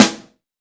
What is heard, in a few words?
Boss DR880 snare; drums